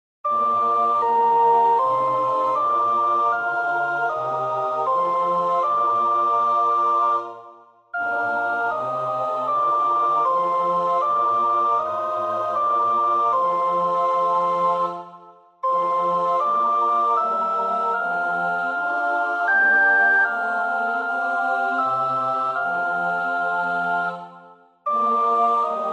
sopraan, koorpartij oefening

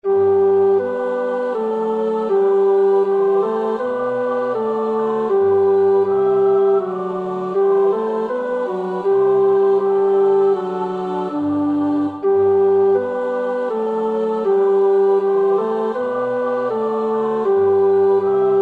tenor, koorpartij oefening; Bach (Johann Sebastiaan); BWV 069; from BWV. 69a

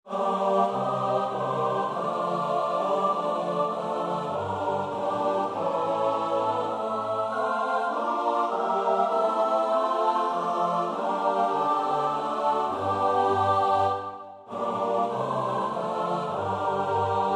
S-A-T-B, koorpartij oefening; Tallis (Thomas)

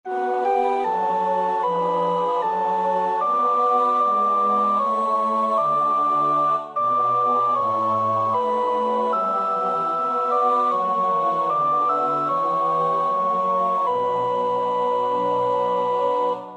sopraan; Bach (Johann Sebastiaan); BWV 092

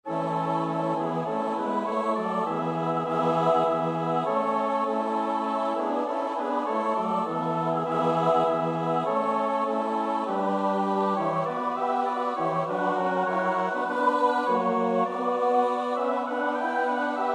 S-A-T-B, koorpartij oefening; Stanford (Charles Villiers); Three latin motets op.38; Op. 38, No. 2

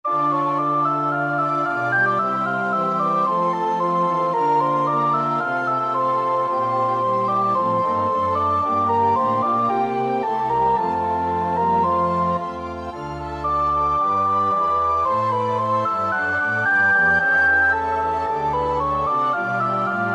sopraan, koorpartij oefening; Reger (Max); O wie selig seid ihr doch, ihr Frommen

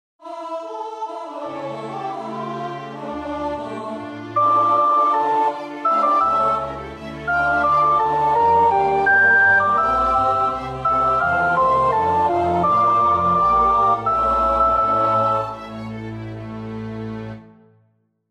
sopraan, koorpartij; Bach (Johann Sebastiaan); BWV 245 Johannes Passion; Nicht diesen, sondern Barrabam

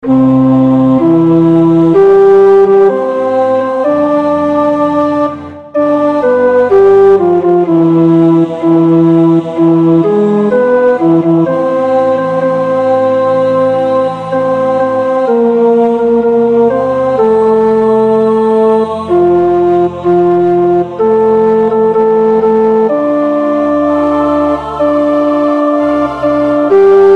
tenor 2; Mendelssohn Bartholdy (Felix); Elias

